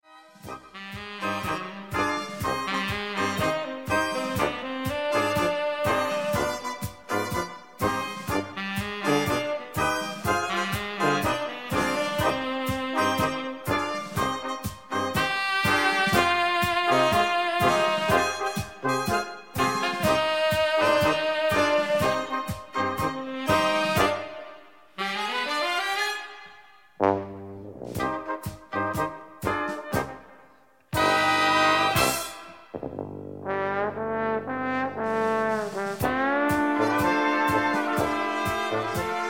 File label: Tango